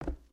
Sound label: Foot Wood Boot Walk; footsteps